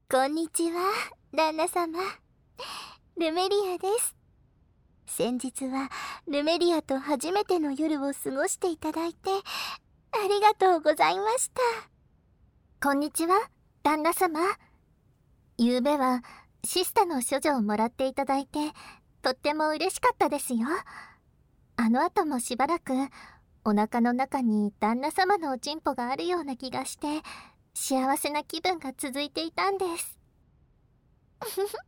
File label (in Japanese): フェラチオ, ASMR; ご奉仕